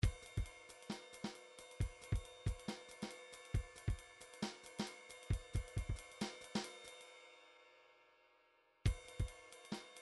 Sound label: midi drums